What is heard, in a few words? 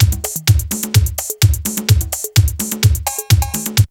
BREAK; Zero G; Man Machine